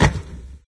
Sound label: drop course gate; There; ThereClient; gamekit